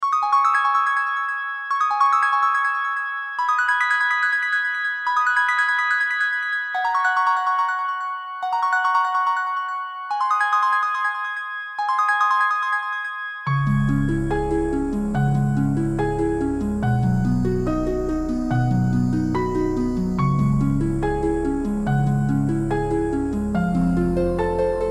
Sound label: Magic harp